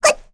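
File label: Vox Jump, korean; Lavril; kingsraid; voices heroes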